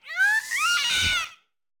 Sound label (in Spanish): Grito de Kilowattrel; Gritos de Pokémon de la novena generación